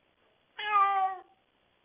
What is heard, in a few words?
cat meow